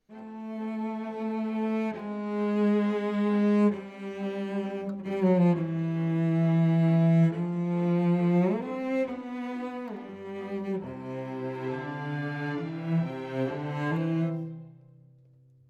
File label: Cello Solo